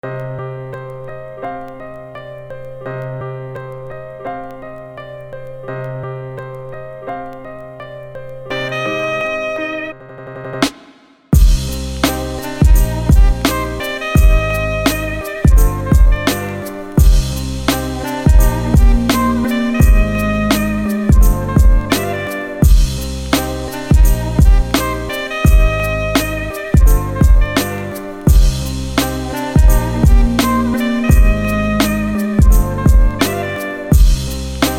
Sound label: Jazz Instrumental